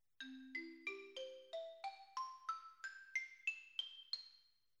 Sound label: Xylo